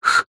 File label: office stationery felt tip pen lid air blow whistle